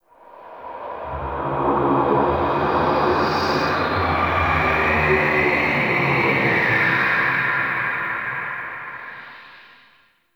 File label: Ghostly Whoosh; Warframe; Man In The Wall